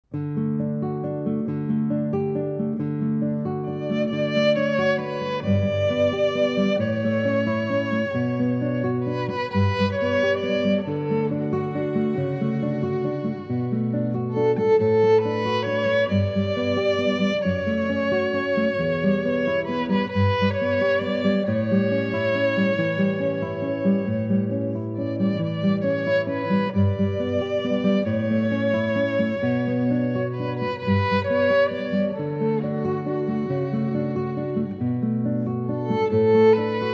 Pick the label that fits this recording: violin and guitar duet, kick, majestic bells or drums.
violin and guitar duet